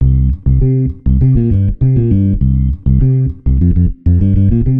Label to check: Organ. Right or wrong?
Right